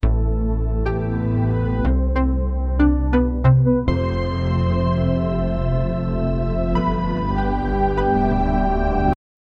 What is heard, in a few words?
sad theme